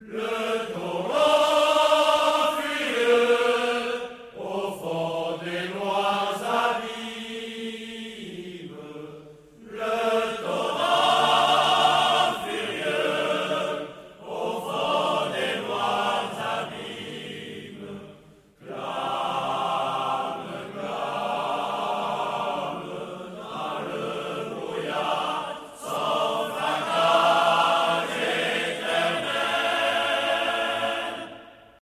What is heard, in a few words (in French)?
le chant montagnard